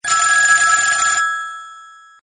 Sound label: Old Phone